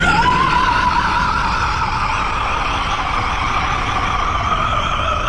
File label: screaming; ichigo